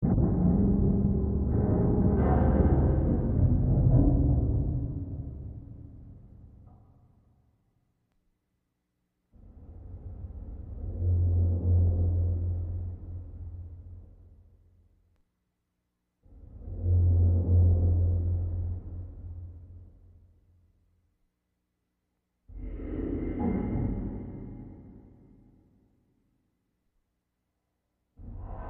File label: Ambiance Metal, Industrial Ambience